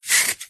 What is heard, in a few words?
foley long hair cut with scissors single cut; zapsplat